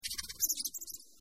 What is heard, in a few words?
mouse squeak; Delicious Emilys Childhood Memories